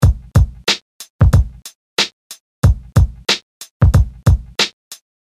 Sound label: Loop, dusty drums; 12-bit preset; FL Studio